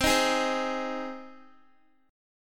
C Minor Sharp 5th C down Guitar; Cm#5 strummed

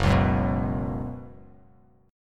Gb Suspended 4th Gb, down; strummed; JGuitar

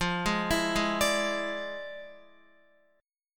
F Minor Major 13th, F, Guitar; FmM13 arpeggiated; x,8,8,9,8,10; 4F,4A,5C,5G,5C,6E

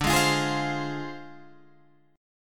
D Minor 7th Flat 5th Guitar Open G

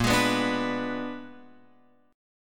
Bb 7th Flat 9th Bb, Guitar Open F; Bb7b9 strummed; x,5,8,6,5,6

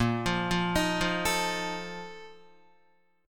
A sharp Major 7th Suspended 4th, Guitar; arpeggiated; guitar chord

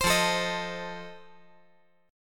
F 7th F down

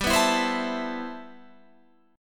C Major 13th G

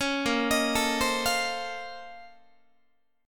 A sharp Minor Major 11th, Guitar; arpeggiated

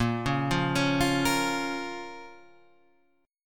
A sharp Minor; A#m Chord {6 4 3 6 6 6} arpeggiated

